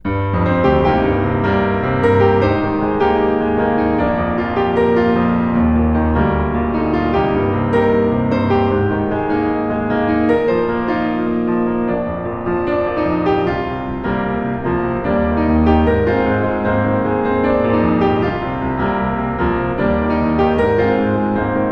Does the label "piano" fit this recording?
Yes